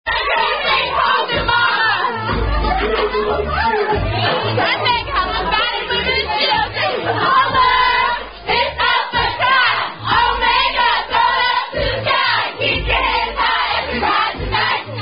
Singing The Alpha Chi Song, Sorority Chant Song; Alpha Chi Omega

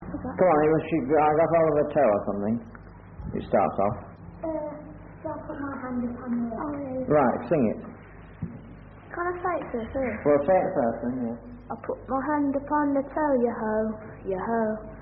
I Put My Hand Upon Her Toe, Singers, Children

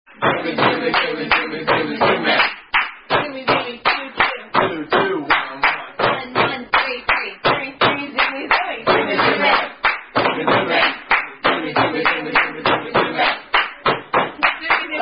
Zumi Zumi, Zoomie Zoomie Drinking Game; Zumi Zumi Extreme!; With 3 Man Drinking Game At End

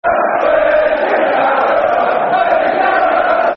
Football Chants; Where's Your Caravan; Derby County Dcfc

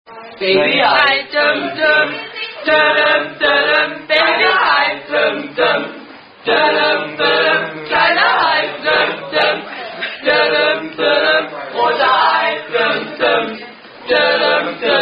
German Attack Song; Kleiner Hai - Teil 2

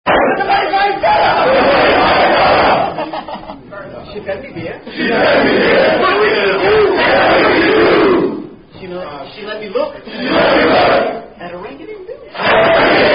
Rugby Song; Ring Dang Do

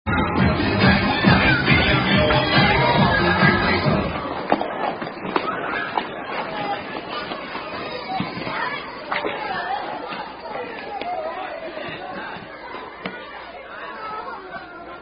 Children's Game At Skating Rink; Dead Bug Game At Skateland

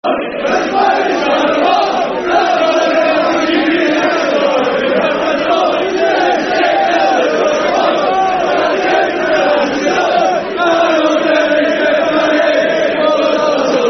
Football Chant (Soccer Songs); One Night In Gay Paris; Tranmere Rovers Trfc

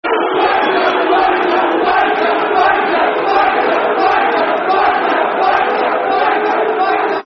Boo, Wanker, Football Chants; West Bromwich Albion Wba; fanchants

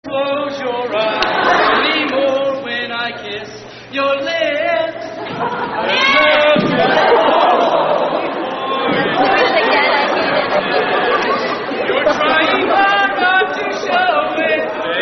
Fraternity Song; You've Lost That Sigep Feeling

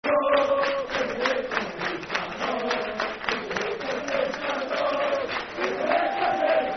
Football Chant, Dundee United; Stand Up If You Hate Dundee; Dundee United Dufc

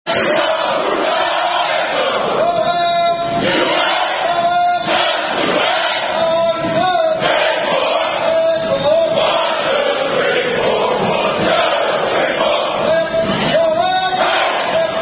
Army Cadence; Unknown Jody

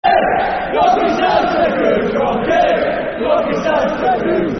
Football Chants (Soccer Songs); Roque Santa Cruz; Blackburn Rovers Brfc

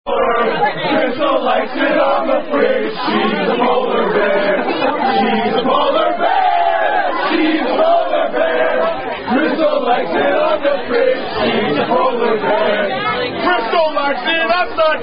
Rugby Drinking Songs; Yogi Bear Variant

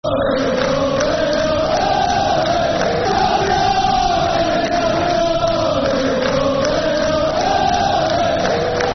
Football Chant (Soccer Songs)

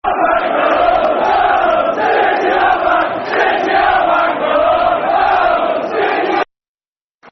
Football Chants (Soccer Songs); City Are Back; Man City Mcfc

